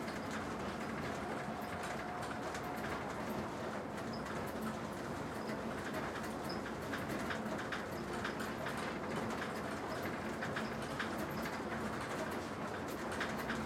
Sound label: Hub Train Rattle Loop